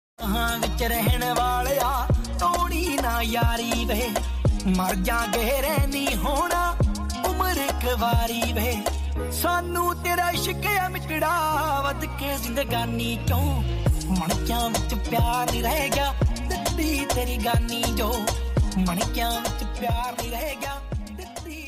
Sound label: Trending Punjabi Ringtone